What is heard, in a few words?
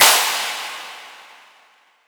normal hit clap; osu